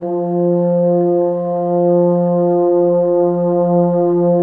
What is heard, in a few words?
French Horns, F2